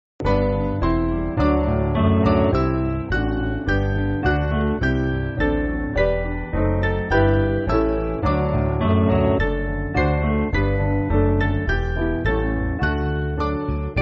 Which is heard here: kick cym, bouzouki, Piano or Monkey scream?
Piano